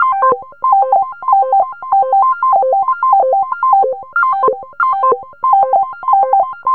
ARPS, ARPS ATMOS; Zero-G Ambient 1

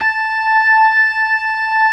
ORGAN LOWER MANUAL; Keith Emerson Signature Series

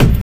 BD, Drum & Bass, 140 BPM; Ueberschall; Drum & Bass Flash Trackz

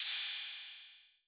808, CYMBAL; CYMBAL P2

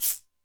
Torpedo, Drum Machines Alesis D4

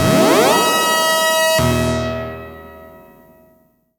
organ synth; InVision Interactive; Keith Emerson; The Most Dangerous Synth and Organ

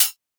drum hat metallic weirdo; Virtual Riot; Drum Hits

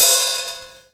HAT, Hip Hop

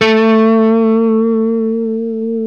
LES PAUL LD, LESP MF A 3; Spectrasonics; Hans Zimmer Guitars VOL-1

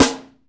SNARE LITE, Processed Kit; Processed Kit 2

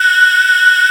shell F#3

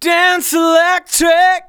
vocals sung F#; Techno Trance Essentials

